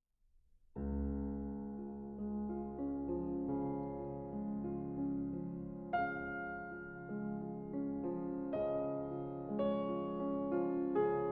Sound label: Nocturnes in D-Flat Major, Lento Sostenuto